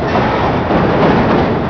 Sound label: train